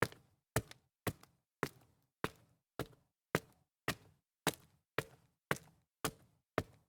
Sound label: dress shoes on stairs concrete